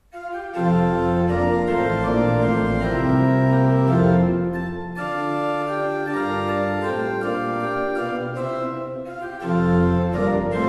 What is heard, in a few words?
Ott, pipeorgans